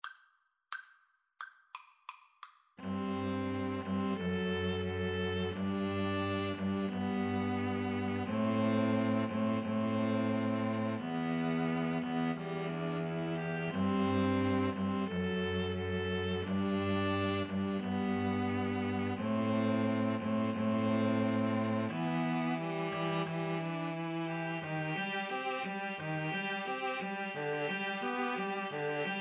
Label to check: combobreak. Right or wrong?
Wrong